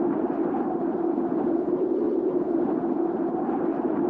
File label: loop wind